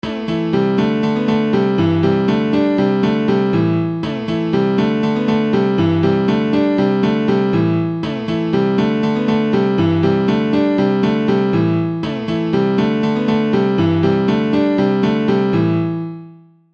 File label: Piano Test